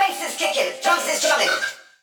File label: Vocal Phrases; Vengeance Samples; Vocals